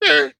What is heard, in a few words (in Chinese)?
羊驼待机声